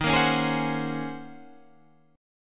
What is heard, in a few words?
Eb Minor 13th Eb down Guitar Standard; Ebm13 strummed; JGuitar